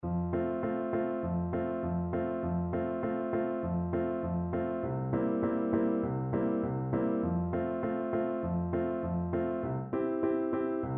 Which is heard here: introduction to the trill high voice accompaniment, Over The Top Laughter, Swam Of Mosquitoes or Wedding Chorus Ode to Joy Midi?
introduction to the trill high voice accompaniment